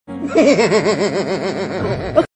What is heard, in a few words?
hard laugh comedy sound effect